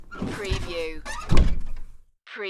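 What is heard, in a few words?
Door Close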